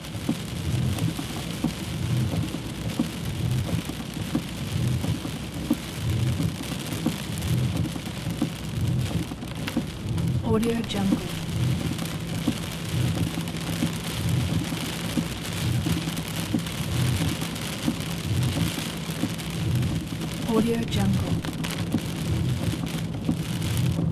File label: weather rain steady windscreen interior loop; blazznet